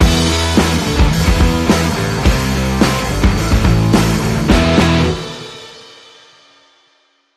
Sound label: Bumper